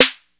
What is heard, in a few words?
snaredrum, tr808